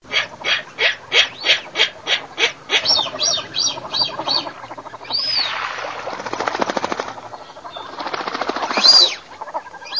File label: pato cutiri, birdsound